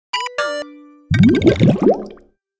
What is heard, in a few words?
beep boop glub